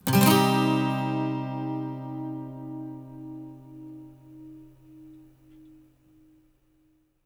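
12 string minor C, Electric & Acoustic Guitar Loops; USB Soundscan vol.04; AKAI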